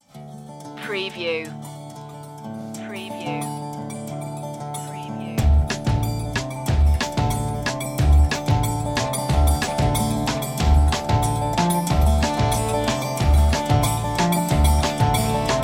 no harmonica

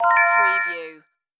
Multimedia Chime

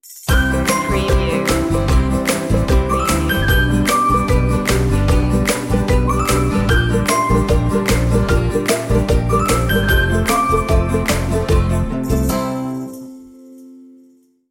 stinger

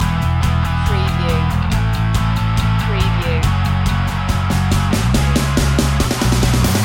garage jam loop

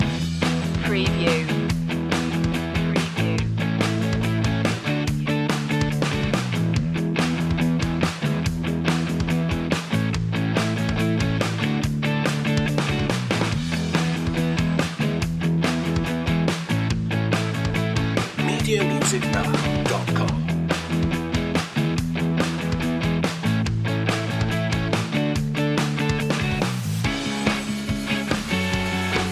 celebrate loop

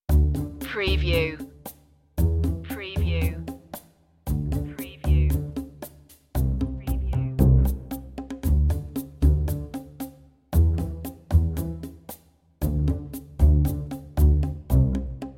bass and perc only

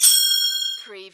Button Sound - Metal Sing Out

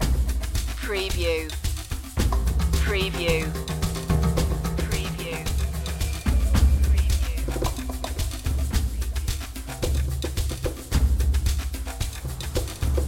african fields loop

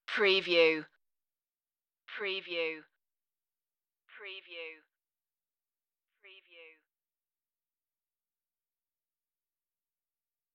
savage future distort stabs only

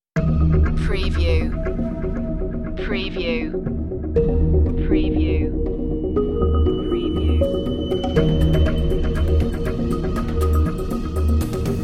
underworld series dramatic cue

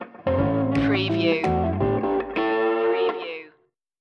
fixin to score stinger; screwball comedy

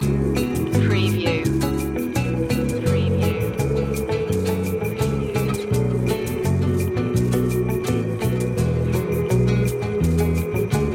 emotional brazil lounge loop

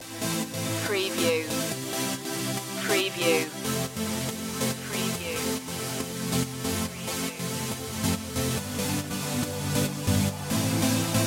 cascade loop

